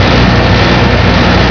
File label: turbine; hl1mp; multy mod